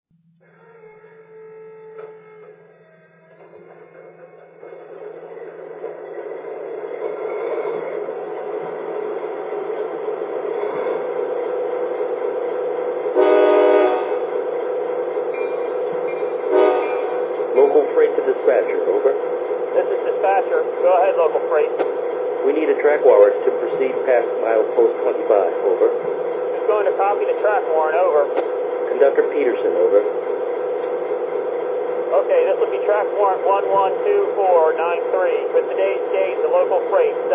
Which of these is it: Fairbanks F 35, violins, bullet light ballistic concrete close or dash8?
dash8